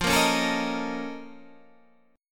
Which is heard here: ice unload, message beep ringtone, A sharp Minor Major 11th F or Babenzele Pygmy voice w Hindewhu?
A sharp Minor Major 11th F